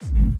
BD Gate FX, Bassdrums; Vengeance Samples

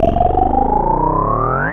Sweeps Medium

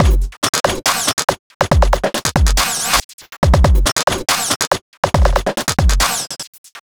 glitch loop, Dubstep; Dubstep Sample Pack

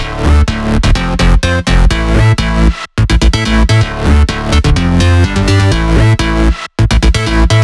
126BPM Melody; Vengeance Samples; Secrets Kit

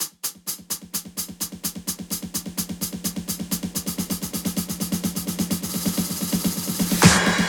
128BPM Piston, House Electro Fills; 4Bars; Vengeance Samples

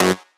Synth Cuts; Vengeance Samples; VES1 Instruments

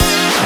Synth Sound; Vengeance Samples; VEE Synth Shots

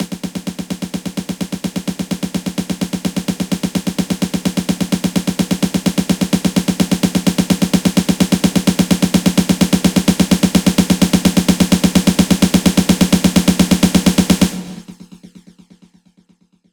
128BPM Love Nation, House Electro Fills; 8Bars; Vengeance Samples

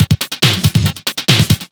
Breakbeat 140 BPM

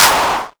Clap; Vengeance Samples; VEC1 Claps

